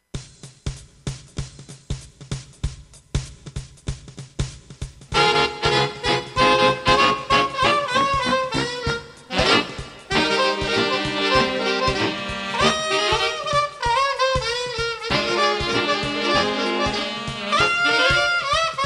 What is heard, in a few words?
sax